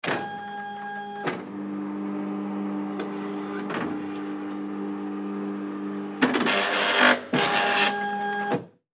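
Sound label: sounds of photocopier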